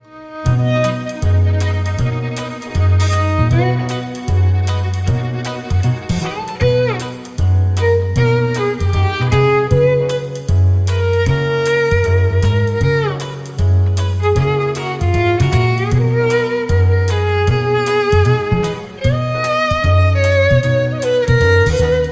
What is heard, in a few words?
violinist; classical musicians